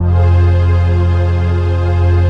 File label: PAD, Dance Mega Synth